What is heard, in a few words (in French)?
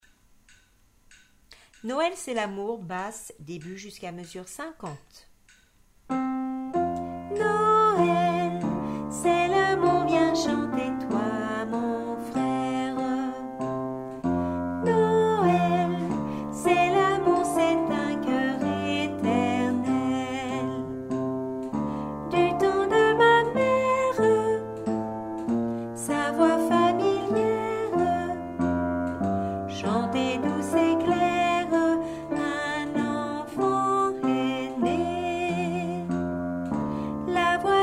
basse